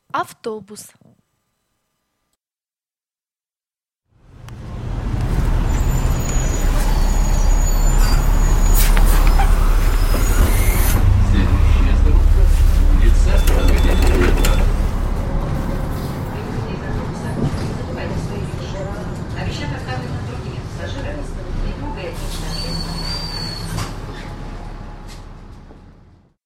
Автобус

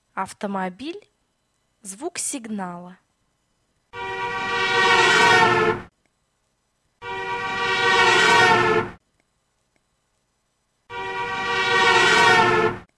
Автомобиль звук сигнала

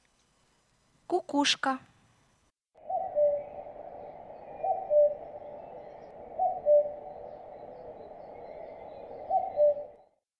Кукушка

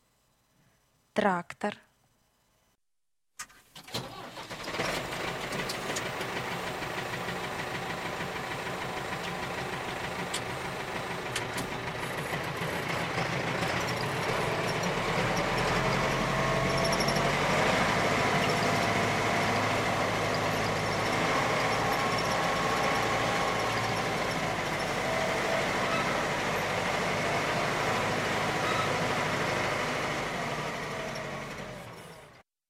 Трактор

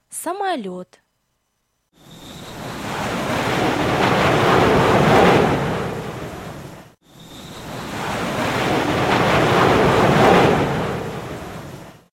Самолет

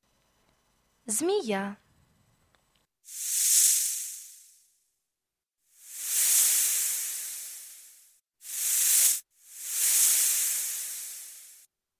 Змея шипит

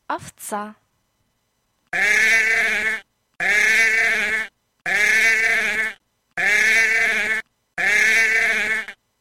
Овца

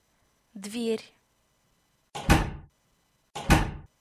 Дверь

